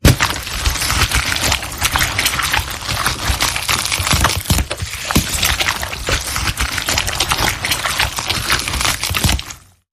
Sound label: Not Human Eating Flesh